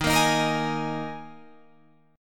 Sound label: D sharp Suspended 4th, Guitar, Drop D; chord shape